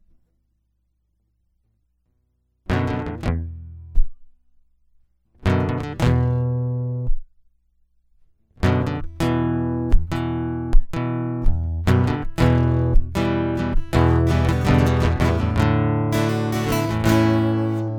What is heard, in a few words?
Guitar Loop; hyper terrestrial